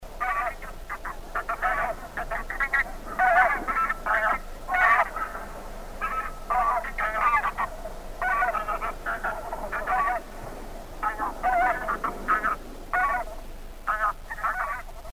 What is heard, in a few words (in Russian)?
gus gum; Краткие сведения об основных охотничьих животных